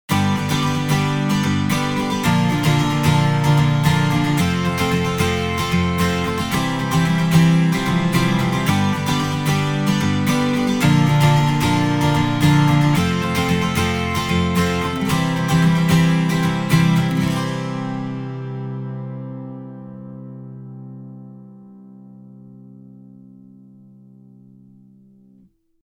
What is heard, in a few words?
Strummed Acoustic 12 String